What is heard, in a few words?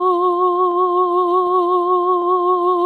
ahh Gb